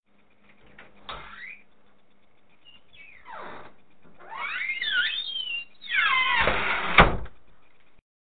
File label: squeaky door